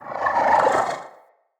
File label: Corrupted Slug Vocal Idle